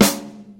trebly acoustic snare c key

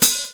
mellow open high hat g sharp key

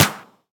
clap sound clip d sharp key; single hits one shots

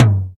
raw tom drum sound g key; tom drum samples

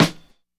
verby snare E key; snare drum samples; drum single hits one shots

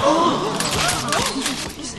GASPING IN SHOCK AND CAMERA FLASHES

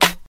good snare sample d sharp key; snare drum samples; one shots

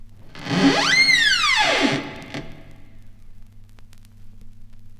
creaky door opens; horror

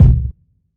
roomy bass drum sound b key

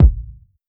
low tone kick one shot a sharp key

